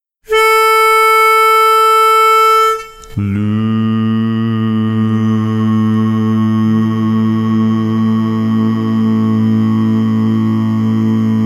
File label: Star Spangled Banner-Bass mono